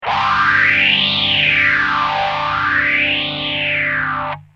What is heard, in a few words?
gtr